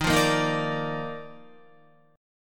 Eb Major 7th Suspended 2nd Eb Guitar 4E 4A 5D 5G 5C 6F; EbM7sus2; strummed; JGuitar